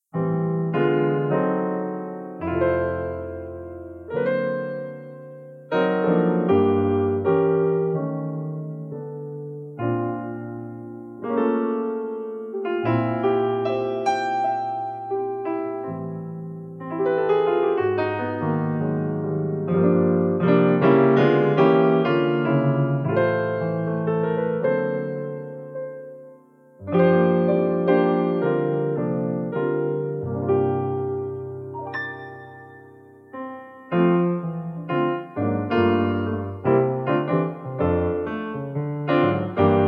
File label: Jazz Cover